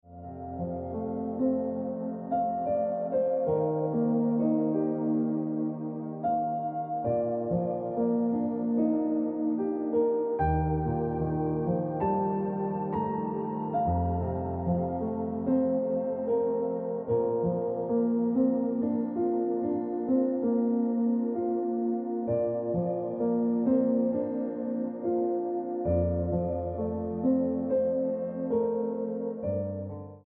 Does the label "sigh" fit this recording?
No